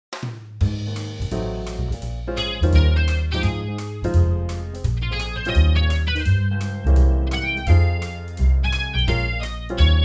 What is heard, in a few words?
Jazz City; Jazzy